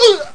karate; fightingspirit

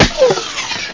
skate

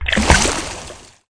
Splash

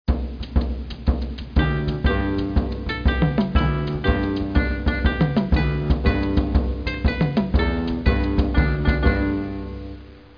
Anthem

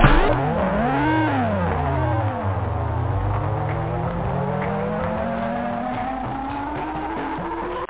game over; da capo vol1

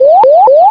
Warning; Assassin

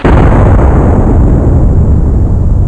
thunder, Nature

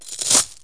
chain; KABOOM! - Wild and Wacky Sound Software; industry

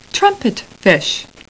Trumpet

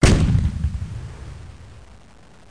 Mortar; Close Combat

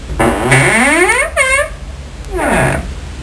Door Opening then Squeaking; Soundsation

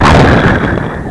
Player EXPLOSION; MacAttack

